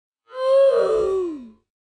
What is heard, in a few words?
death sound slow; roblox